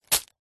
industrial small plastic bag of metal screws catch; zapsplat